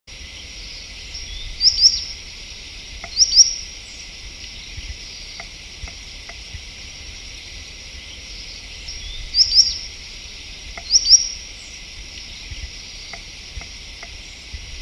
Cantos dos Passaros Macarico Solitario Tringa solitaria

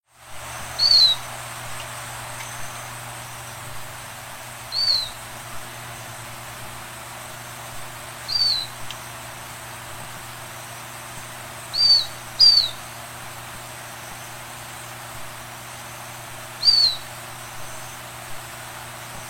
canto do papa formiga de topete

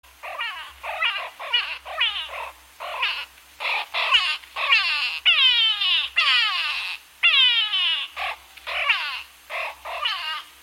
canto do turaco cinzento

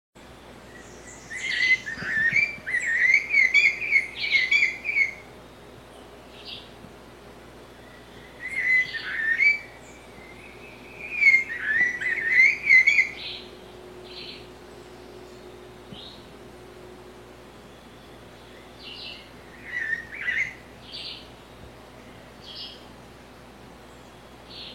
Canto do sabia cica

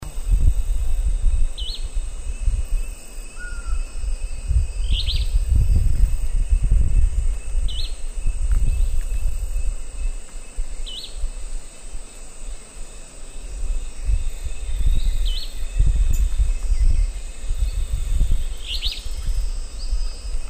canto do papa moscas de alder

